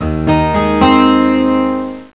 12-String Strummer

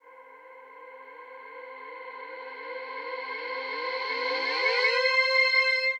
SYNTH PAD AMBNT 160 C, Ambient; Sphere

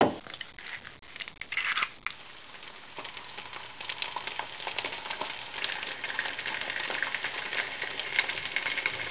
fry egg; 12000 Graphics Pack - A Complete Graphics Collection For Your PC

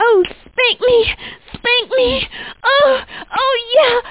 vocal; Duke Nukem 3D Kill-A-Ton Collection

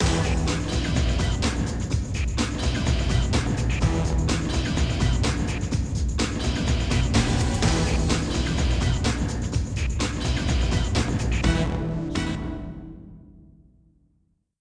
ditty

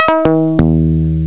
bass guitar; instruments

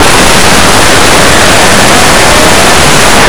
vcr; Blue Heat

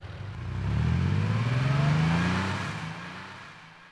amb car; PC Gamer IT CD 49; UrbanChaos

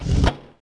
lock on

